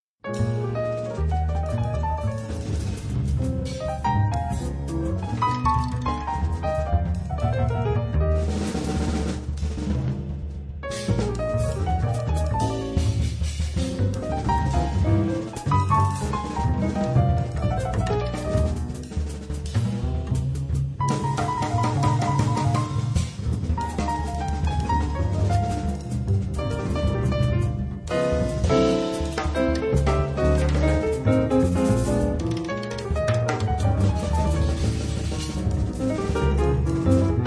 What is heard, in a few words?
Music Box